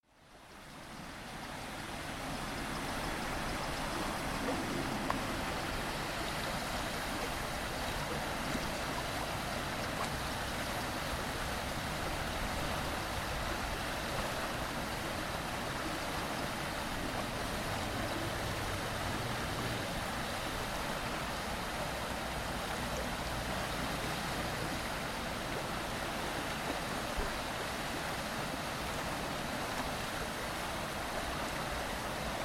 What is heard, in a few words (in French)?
ambiance